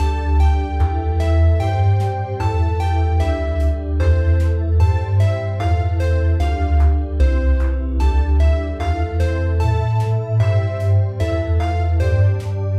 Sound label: victory